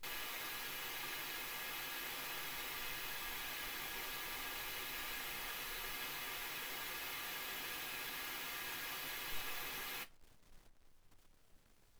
wgn 48kHz

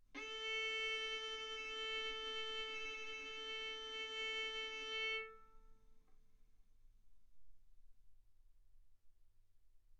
Violin arco A4 B4; mf; sul A; stereo

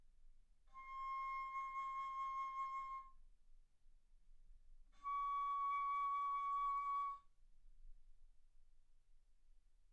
Bass Flute ff C6 Db6

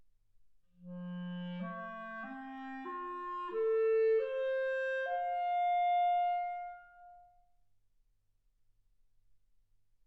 clarinet

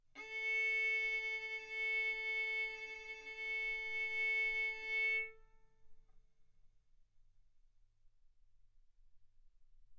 Violin arco mf sul A A4 B4 stereo

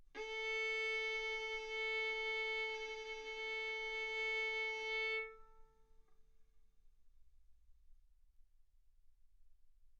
Violin arco A4 B4; mf; stereo

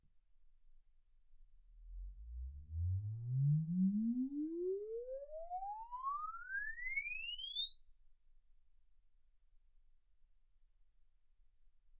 exp swept sinus 10Hz 24kHz

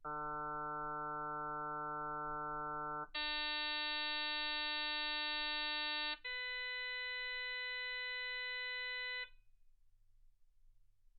harm sinus 48kHz

